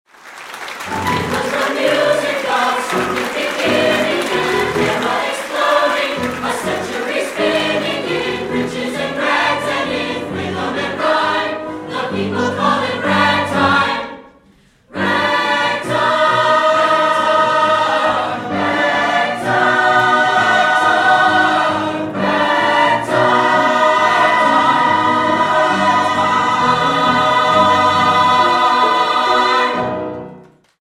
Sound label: ragtime